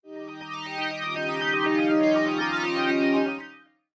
Jingle Treasure Room; The Binding of Isaac Rebirth Afterbirth; Discoverb

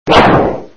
SFX sound (axe swing); Red Baron

